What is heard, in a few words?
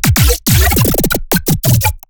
glitch stab; Virtual Riot; Glitch Stabs